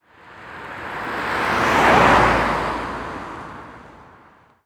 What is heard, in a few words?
Transportation Cars Honda Civic Drive By Slow